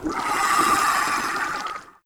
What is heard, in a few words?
CROOK PEACE IDLE